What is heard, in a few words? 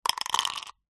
leisure dice into plastic shaker